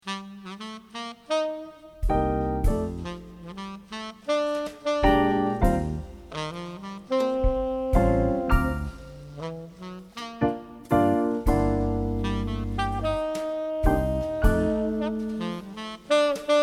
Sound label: Sax Keys Bass and Drums; Cocktail and Dinner Music